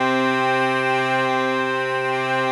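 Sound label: BAGPIPE DRONE; ETHNICSTUFF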